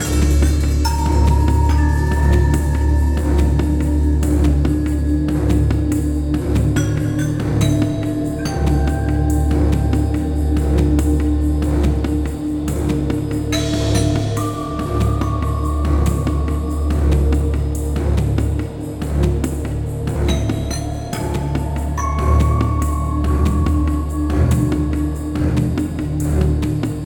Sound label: Suspense, music; Movie